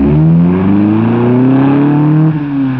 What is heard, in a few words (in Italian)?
macchina, Veicoli; Effetti sonori